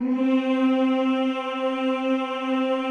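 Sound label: choir C3, men; Sound & Vision; Gigapack I CD 2; CHO Mmhh + Rrrr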